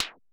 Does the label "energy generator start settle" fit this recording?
No